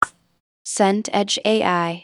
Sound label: knuckles crack